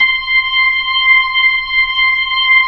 B-3, Jazz

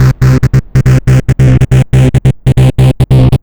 Trance Bassloop 140bpm, Bass Arps

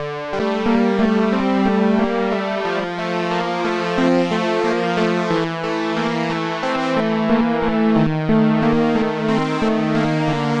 Bright Synth; Sonic Foundry (Sony Creative Software) - Club Joints and Street Anthems; Time Traveller

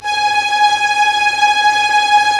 STR SEC; Roland - LA Composer vol. 1

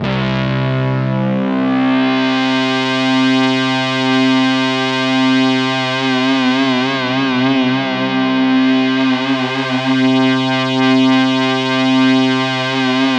C1, Roland JV 2080 TECHNO PAD

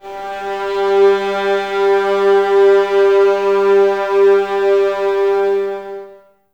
VS G 3, 11 Violins, Mute; Miroslav Vitous; String Ensembles

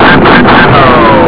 laser; Amiga Soundtracker sample

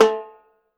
TIMBALE; Houseworx; Drums; Perc

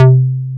A-ARP, AN BASS; AKAI S-Series CD-ROM Sound Library VOL-7

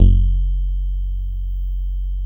GLASSY F#1, BASSES; Best Service Alpha Dance vol.2

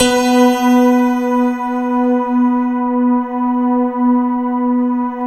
SYN PIANO, Roland JD 800; Sound & Vision - Gigapack I CD 2; JD 800/990

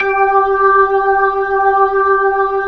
B-3 Jazz; G 5; Keyboards of The 60's and 70's

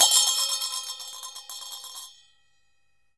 CHINA SM3 L, Heart of Asia; Spectrasonics - Heart of Asia CD2

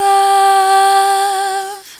VOX; Zero G; Man Machine

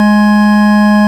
VOX, VOX ORGANS; Greytsounds VOL-1

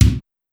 Kick, Clavia DDrum-AT, Pitched Up

